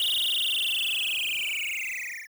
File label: Laser Shoot